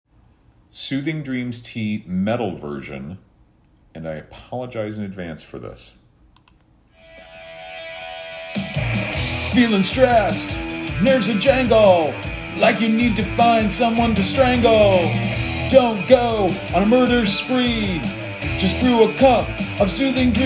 Metal Version Vocal

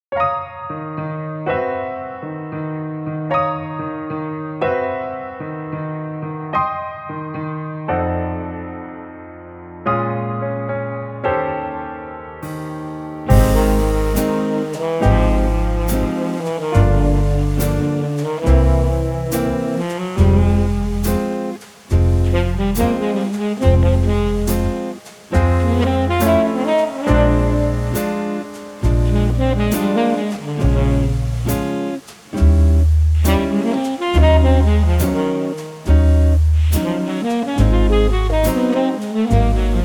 Key of A Flat Instrumental

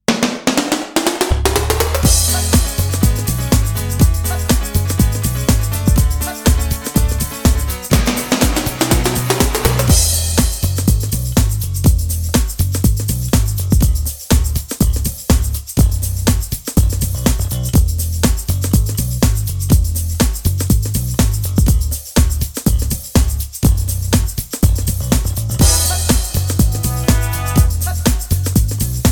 Commercial Instrumental

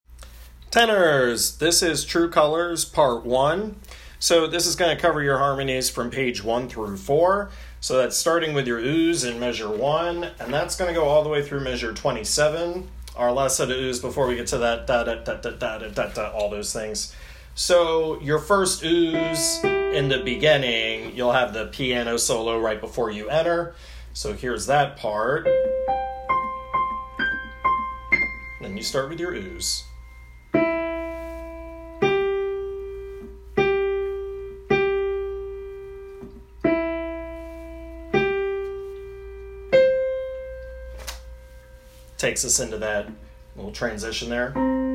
Tenor

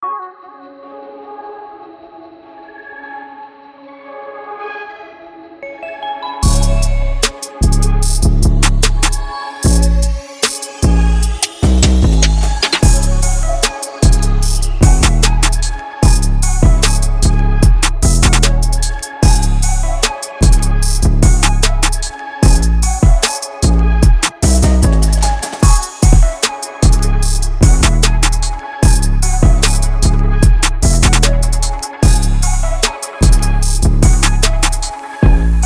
Rap Version Instrumental

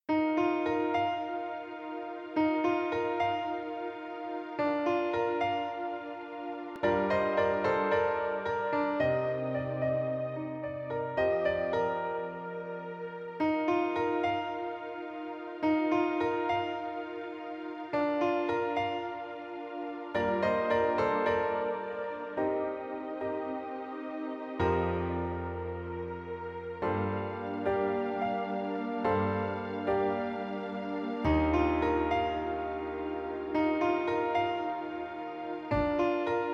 Instrumental

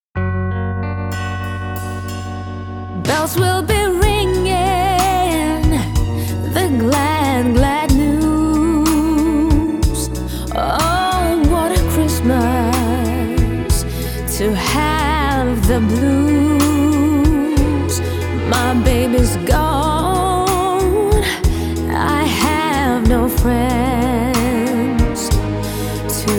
Backing Track

